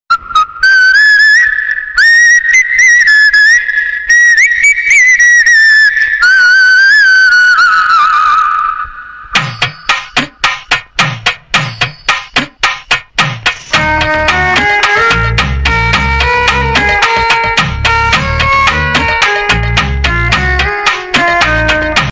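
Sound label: background music Ringtone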